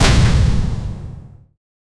deep sci fi cannon shot

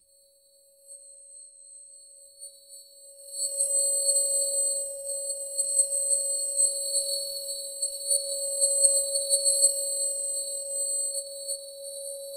soft shimmering aura; ethereal

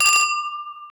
hotel desk bell ring

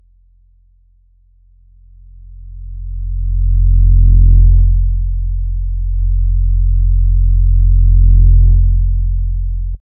bass intro sound; donner

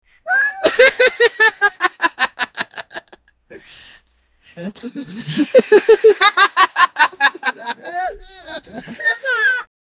19 year old african, laughing

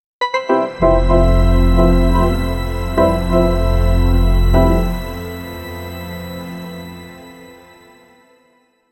upbeat energetic logo rev, logo

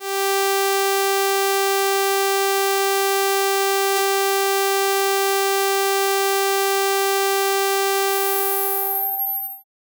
musical

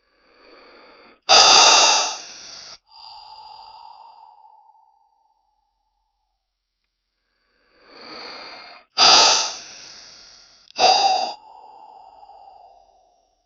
natural yoga, health

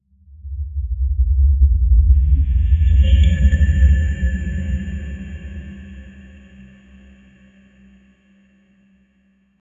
cinematic pulse at 60 bpm, sub hit

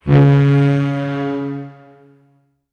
a bold medieval horn blas; musical instrument

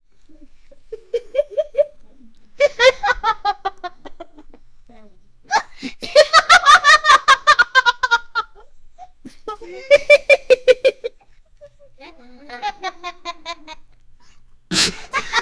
9 year old african, family

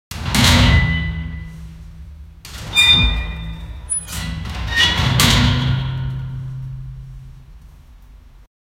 opening bank metal vault

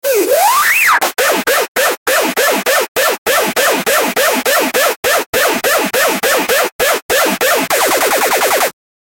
clown jumpscare

